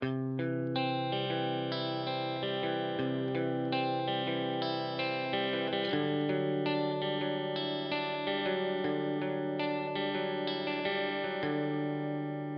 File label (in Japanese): ミックス クリア